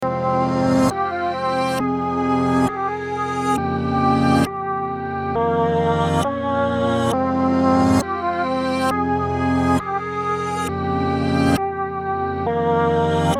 135BPM Fm, Melody Loops; Unison Essential Melody Loops

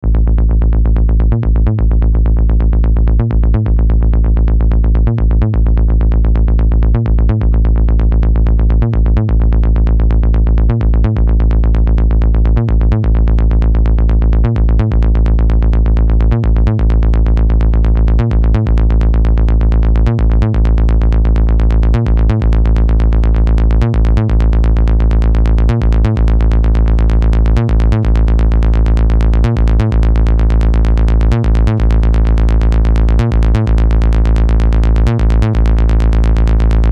Bass Loop (Am); Unison Artist Series; Victor Ruiz "Power Pack" Vol. 1

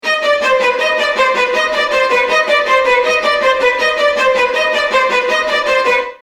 VIOLIN ARP 160BPM D#

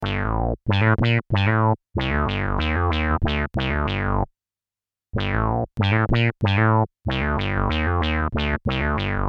Bass Loop Dubs 94 BPM Gm; Unison Beatmaker Blueprint

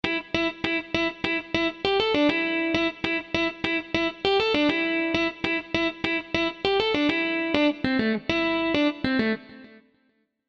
Funk MIDI Melody, Am, 100; Funk MIDI Melody Collection

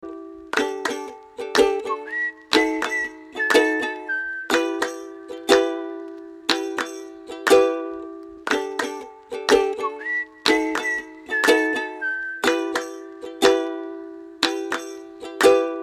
Melody Loops

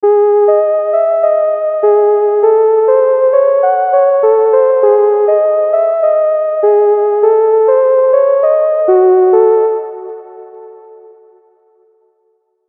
100bpm, Harmonic Dominant; MIDI; Unison Harmonic Dominant Advanced Scales MIDI Collection

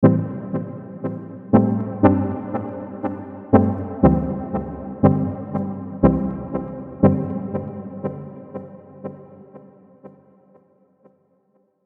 Major Pentatonic Prog; Unison Major Pentatonic Advanced Scales MIDI Collection

